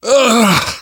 hurt minor, survivor voice

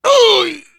hurt major, survivor voice, biker

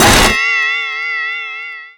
bell break

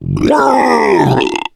male spot prey; boomer; attack

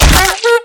rifle swing hit; survivor; clown

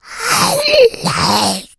shout, infected; npc, alert, becomealert

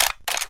hunting rifle clip out; weapons

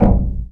glass sheet impact soft; physics

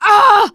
hurt major, teen girl; player; survivor; voice

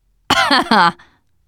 laughter, teen girl

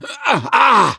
hurt major, survivor voice; gambler

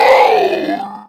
lunge scream end; hunter

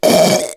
choke, survivor voice, biker

